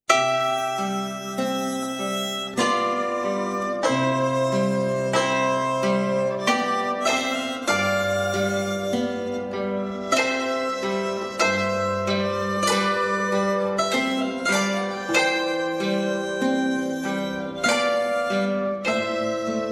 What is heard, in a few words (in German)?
Menuett Stubenmusik